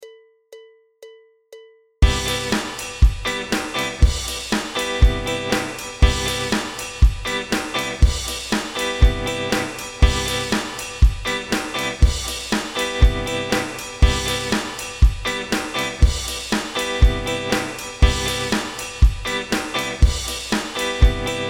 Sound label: impro cm7